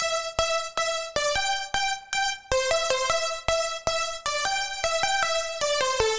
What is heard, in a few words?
Lead